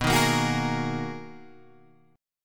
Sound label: B Major 13th B Guitar Open G